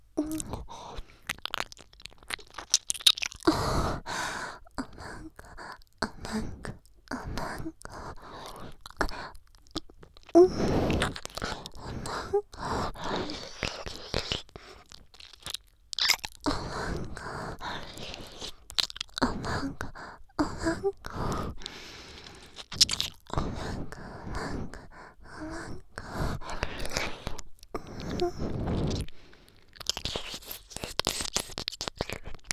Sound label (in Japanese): 耳舐め喘ぎ; 右差分; お屋敷メイドのハーレム淫語ヘヴン; バイノーラルオナサポ音声; 静華